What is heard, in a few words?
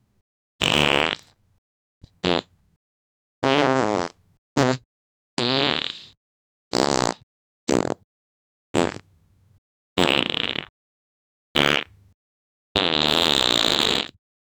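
fart sounds of various types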